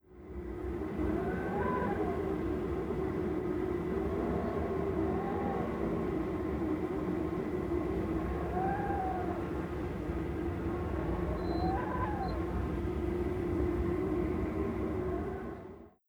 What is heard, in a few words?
Birjand Wind